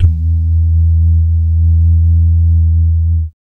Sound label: BS DUMM, Vocal Planet Jazz & FX; ILIO; BASS DUMMS